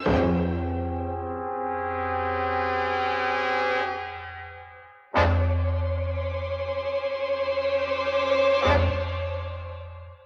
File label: strings and horns, sad loop; loops